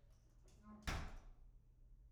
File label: door slam; IEEE AASP